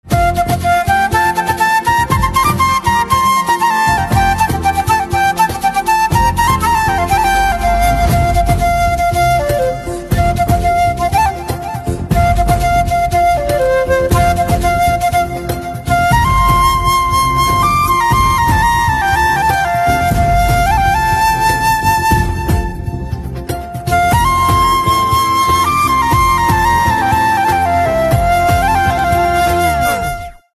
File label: Flute Instrumental Ringtone, desh bhakti